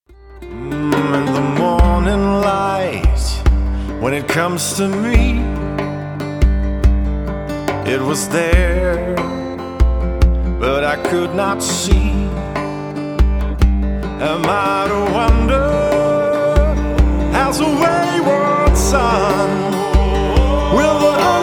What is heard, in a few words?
EN Song Solo